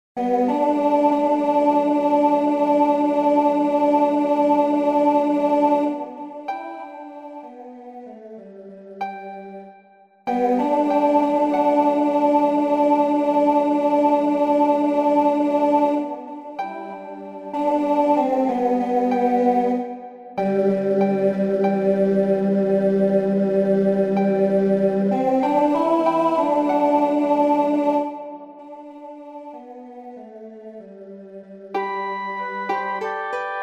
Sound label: Chorus Bridge Sop